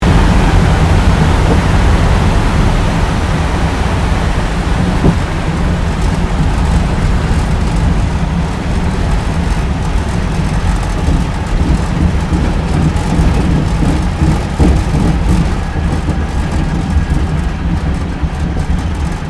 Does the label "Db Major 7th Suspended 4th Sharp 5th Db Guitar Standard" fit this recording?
No